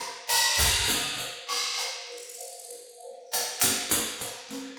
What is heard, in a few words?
Kabuki Metal; Ambient Cinematic; Rhythmic Atmospheres